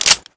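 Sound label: revolver close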